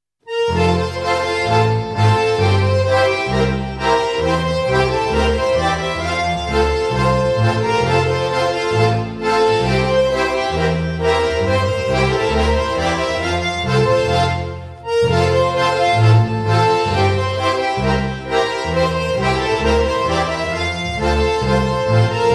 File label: Am sine